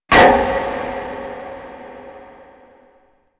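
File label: manhole; PC Gamer IT CD 49; UrbanChaos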